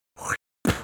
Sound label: Spit